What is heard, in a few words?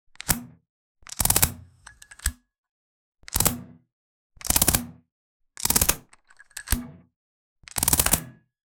realistic kriss vector, magazine